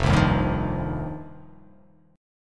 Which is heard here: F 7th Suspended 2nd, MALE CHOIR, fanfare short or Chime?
F 7th Suspended 2nd